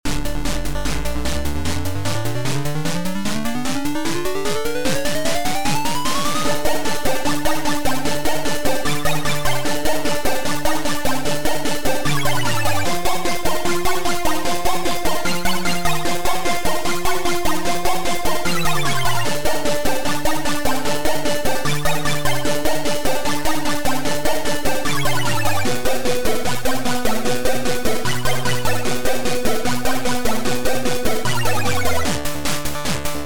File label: Game music; Mr. Slidey